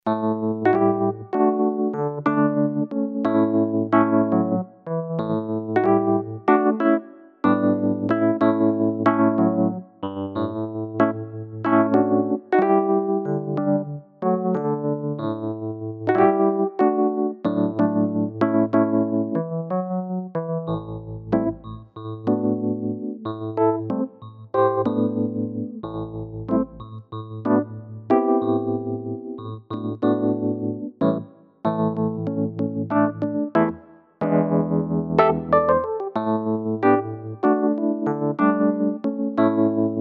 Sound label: WURLY EXTENDED